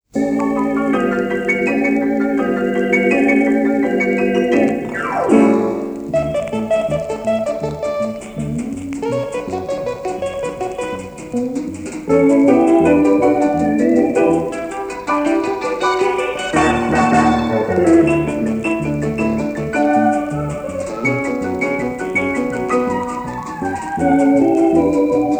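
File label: Ensemble of Electromusical Instruments, foxtrot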